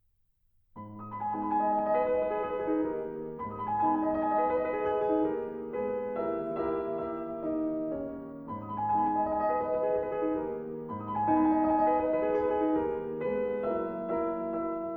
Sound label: Piano Sonatas Impromptus; Paul Lewis; Schubert; Vier Impromptus op IV